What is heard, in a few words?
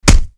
impact flesh; Modern Warfare